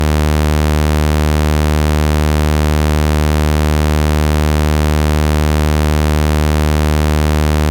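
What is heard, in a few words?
Oscillator Saw Allmost 110hz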